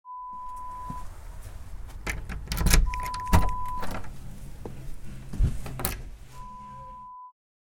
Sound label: Unlock Door sound